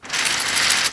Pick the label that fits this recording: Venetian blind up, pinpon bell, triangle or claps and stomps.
Venetian blind up